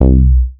Bass

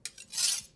Knife Against Knife

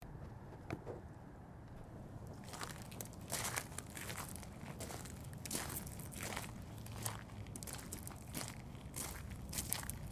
Gravel Steps

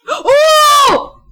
Gasp Oooh

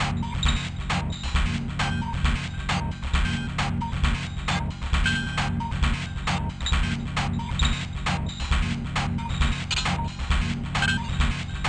Machine

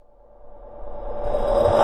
Reverse Fx

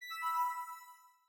Jingle

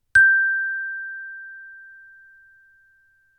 Chimebar G

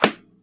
Book Hit

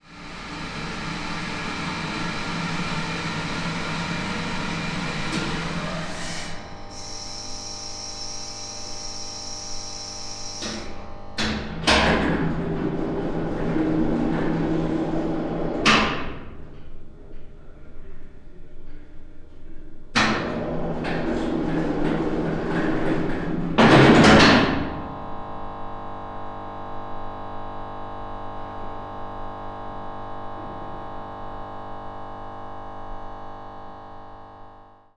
Hydraulic Lift

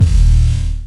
Sfx Short Bassy Industrial Hit

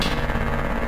Granular

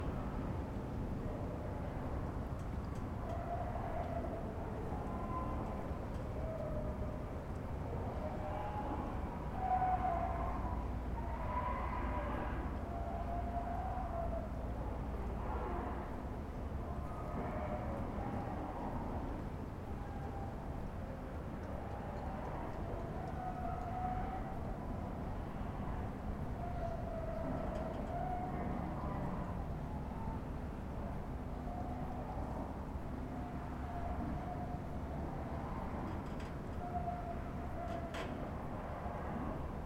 Scary Harbour Atmosphere

